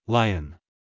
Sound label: lion